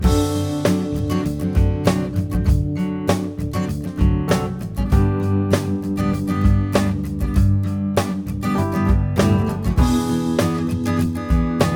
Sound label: Slinky Groovy